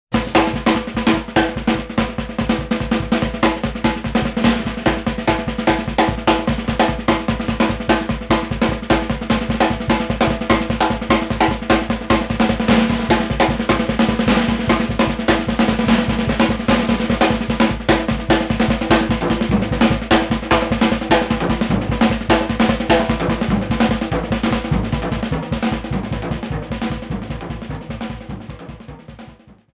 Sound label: drums solo